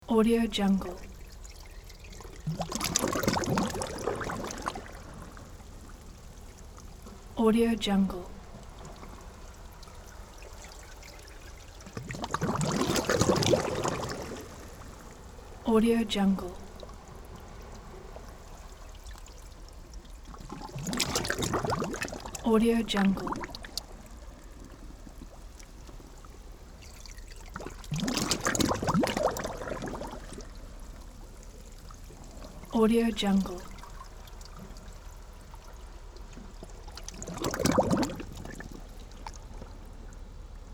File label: gurgling water